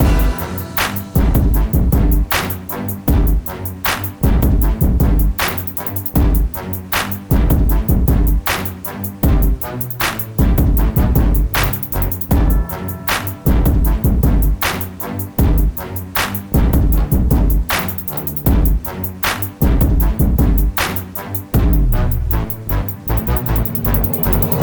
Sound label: Music Beat; Learn to Fly 3